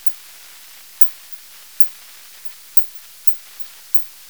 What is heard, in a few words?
Nyctalus noctula; Santena TO